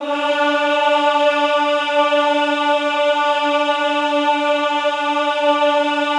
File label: EHH D3, Classical Choir; Best Service; Extended Classical Choir; EHH MIX